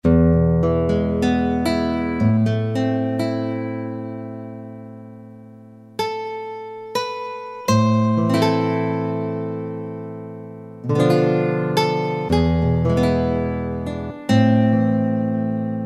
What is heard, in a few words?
Classical Guitar